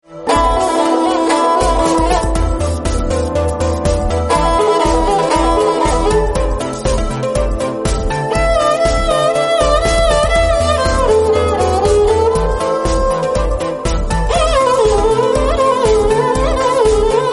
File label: sarangi speed gamak